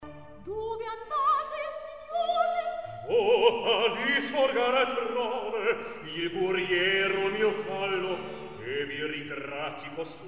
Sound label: Recitativo; Dove andate signore; Mozart; La Finta Semplice